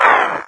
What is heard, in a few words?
pain, revanent; doom3